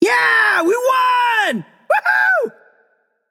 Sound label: nv yay; smite; xbalanque